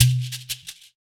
Shekere

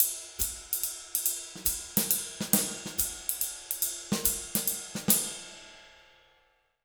Jazz Latin Drumloops, 140; USB Soundscan vol.08; AKAI

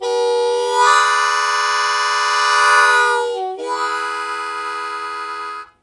Blues Chord Attack E1, Harmonica; 90 s Sample CDs; Zero G

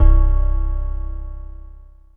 E.P. C1; Greytsounds; Scott Peers Sound Engineering

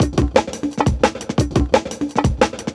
DNB BREAK

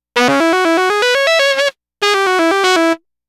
Sax Line; Dr. Fink's Funk Factory

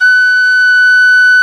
P WHISTLE, Old World Instruments, Winds

